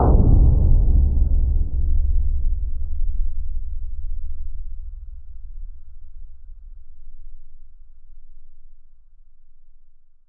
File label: Oil Convector Hit